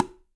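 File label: Bongo High Tip; Bongo A H Tip; Latin Percussion; Drums; Drum Hits; Ableton; Factory Packs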